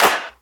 hip hop hand clap sample f sharp, Royality free clap single hit tuned to the F# note